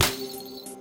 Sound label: Big Snap, Drums; BB 2HTC Samples Vol 4